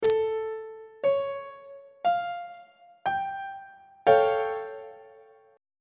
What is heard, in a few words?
a augmented 7th chord on treble clef